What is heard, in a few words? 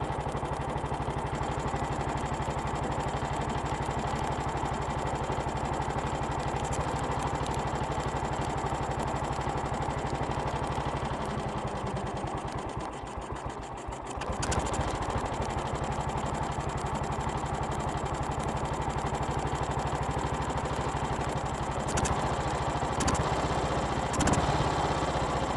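bsa m20 onboard idle revs saddle; dpa4061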